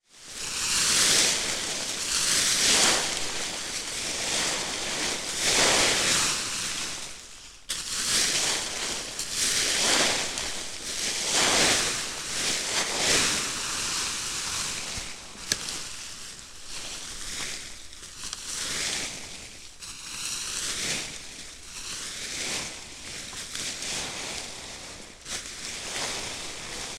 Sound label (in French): ratissage; castanea vibrations le quiz